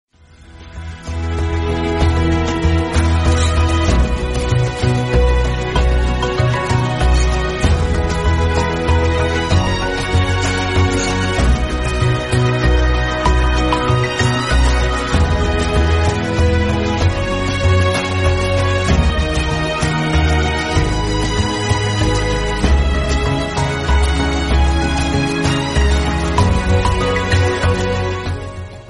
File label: samsung galaxy s10e new